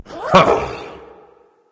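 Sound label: "heh heh", nonverbal; smite; voicepack; khepri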